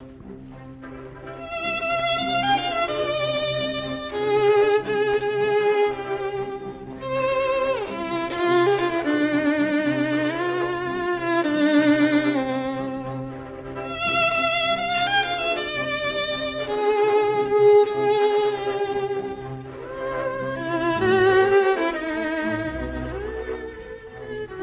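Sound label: Introduction and Rondo Capriccioso, Violin, LIVE RECORDINGS; SAINT-SAENS; Cembal d'amour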